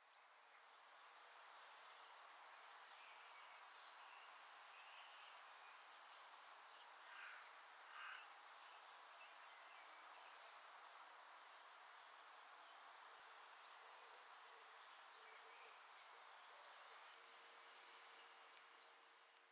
Turdus merula; SETE

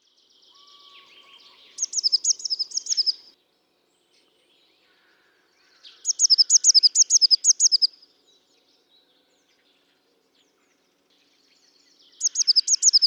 Cyanistes caeruleus; SETE

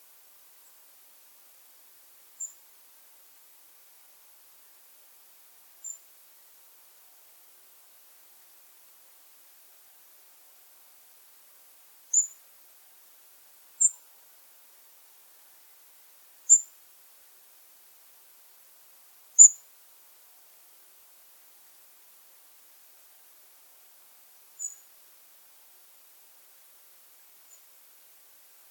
Erithacus rubecula; SETE

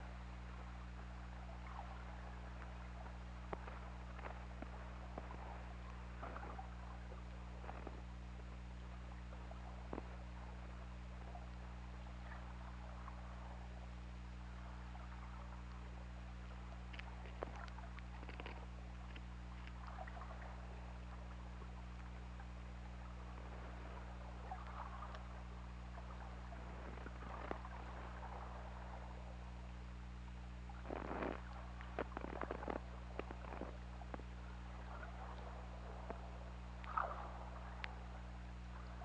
Orca; OrcaLab

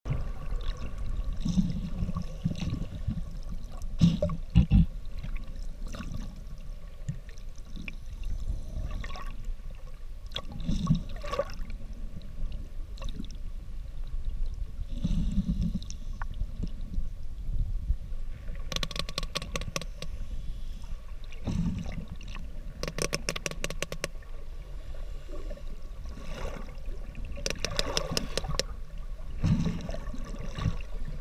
Adélie

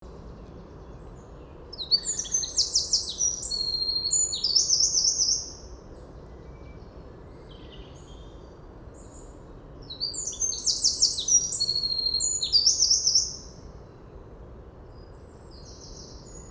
Troglodytes troglodytes; SETE

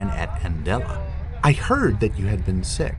noisy

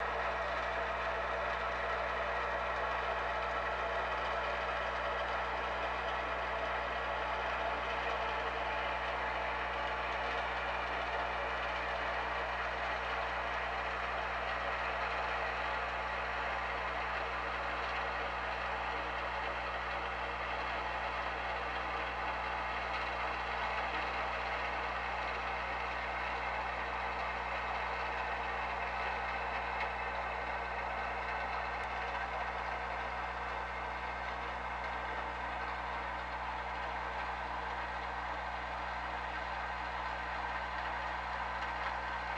Orca; OrcaLab

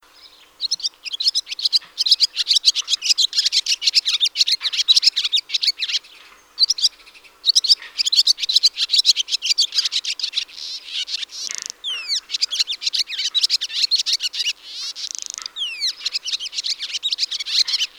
Hirundo rustica

